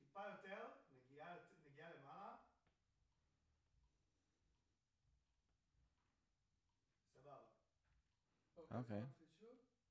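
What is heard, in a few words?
Vocal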